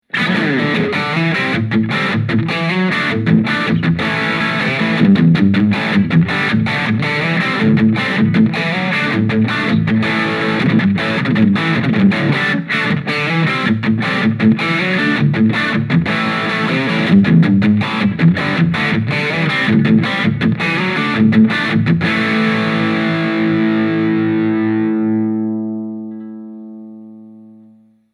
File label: Super Fly Crunch Single Coil; Blackstar